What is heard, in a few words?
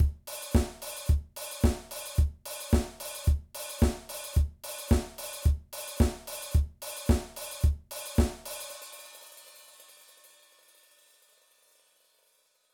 Disco Drum Beat